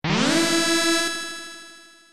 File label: zap charge; ff3; ff3us